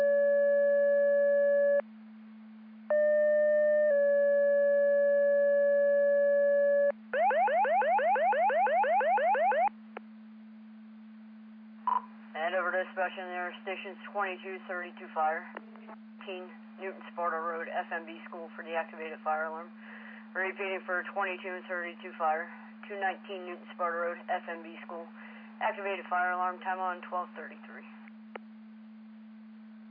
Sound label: Siren; Andover Twp Boro